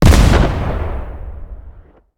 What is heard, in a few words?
flame explo